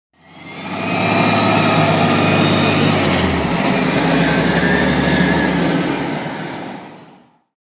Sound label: recycle garbage truck passing